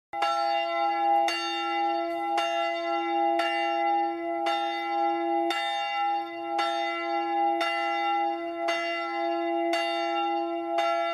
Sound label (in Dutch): klokken klaaswaal, het geluid van de kerkklok; Dorpskerken in de Hoeksche Waard